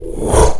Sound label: fire rush